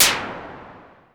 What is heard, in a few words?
TECHNO PERCS; Crypton - DrumBase5000